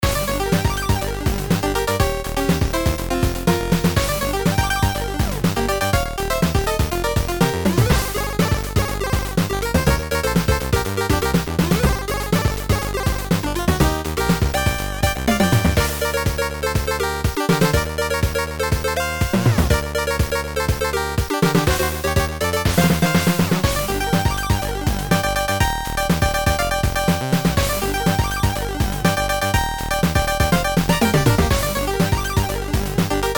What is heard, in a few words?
8Bit; CVAdventure